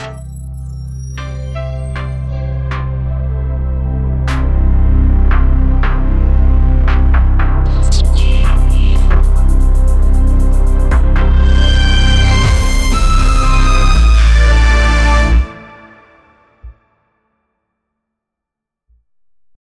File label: a futuristic intro for a, game show